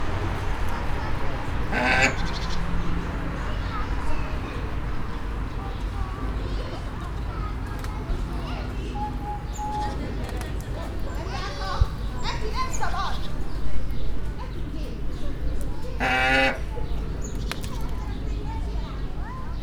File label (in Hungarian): első felvétel, pusztai sas; debreceni zoo 2019